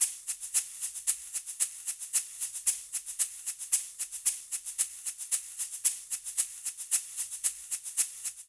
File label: Calypso Shaker 113bpm; Latin Percussion; Ableton; Factory Packs